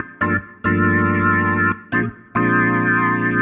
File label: Organ 140 G, jazz keys, Chordy Loops, 140bpm